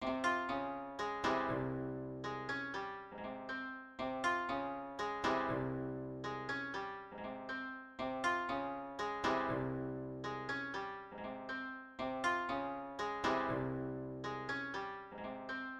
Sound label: Koto Loop